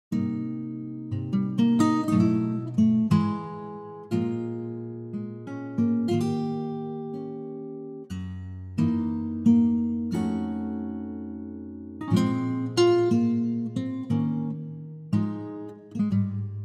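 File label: Ab Acoustic Guitar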